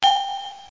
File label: ding; ARCADE; Z-FORCE